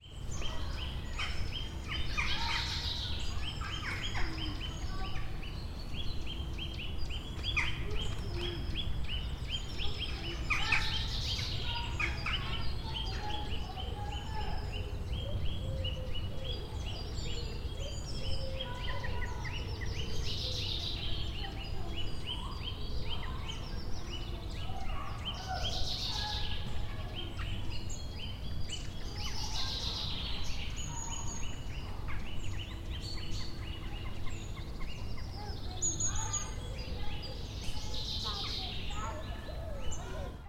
sherwood forest ambience with school party in distance